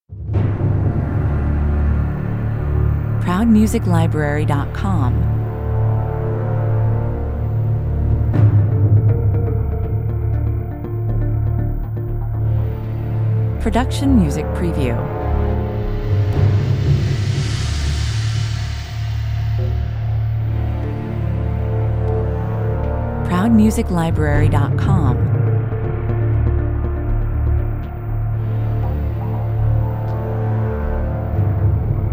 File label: dark arriving underwater 113 bpm